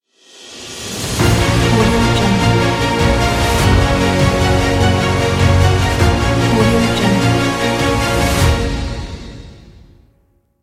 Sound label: the epic logo